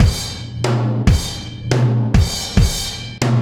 Combo Particle, Processed Drumkits; Zero Gravity Beats; Particles

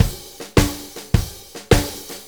Blues Loop, Full Kit; Sonic Foundry (Sony Creative Software); Loops & Samples Drum Tools

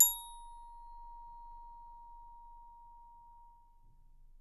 A#3, Percussion & Harp; Miroslav Vitous

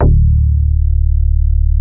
D2, DIGITAL BASS; GENESIS; Music Store - Claudius Diemeter Schwerlos

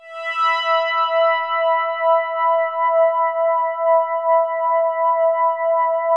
5th soft E4; SPACIOUS; Music Store - Claudius Diemeter Schwerlos; SPACIOUS 1

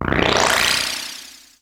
SQUEEZE ME, PERC SWEEPS; Trance Fusion

